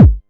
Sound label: 909 Kick, Drum Machines; DrumstationV1